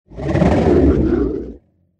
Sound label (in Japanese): モンスターの鳴き声